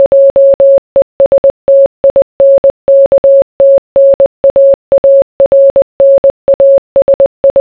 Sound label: morse